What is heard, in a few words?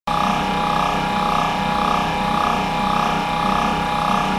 Inner Race Bearing Fault LIVE RECORDING; Wireless